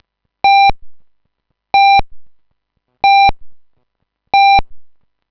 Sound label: tone